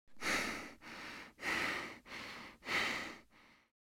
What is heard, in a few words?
Human breathing slow and steadily